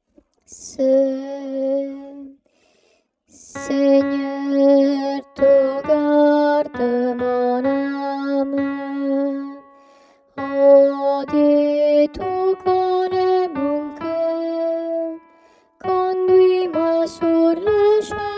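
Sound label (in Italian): contralto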